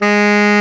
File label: TENOR SAX G#3; Greytsounds VOL-1